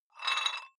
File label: dumbbell take off rattling weight, fitnessstudio